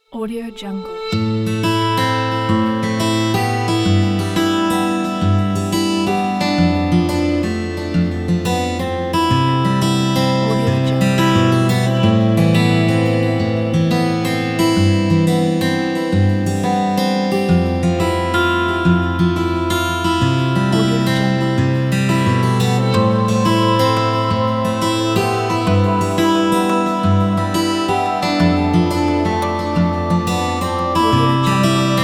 acoustic guitar sad inspiring

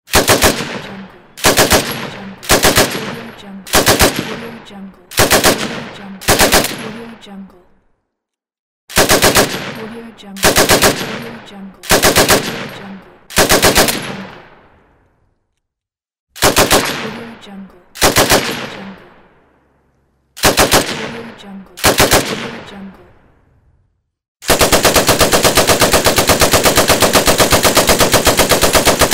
machine gun antique; volkovsound

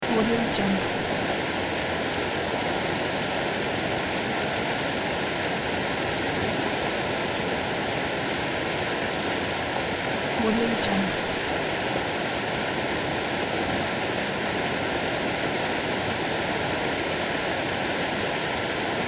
river large; justsoundeffects

